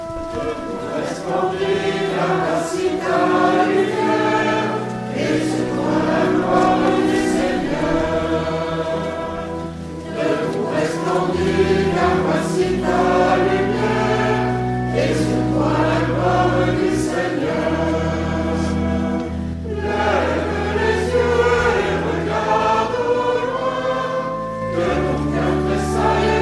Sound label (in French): chant entree